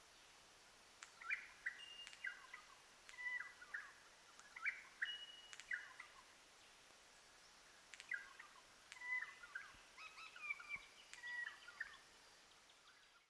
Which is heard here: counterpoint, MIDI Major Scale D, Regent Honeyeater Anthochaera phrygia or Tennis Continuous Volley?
Regent Honeyeater Anthochaera phrygia